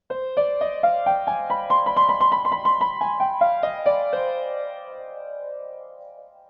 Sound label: oriental